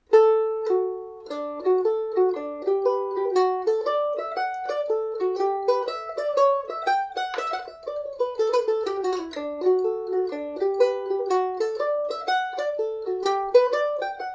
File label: hornpipe, mandolin; cliff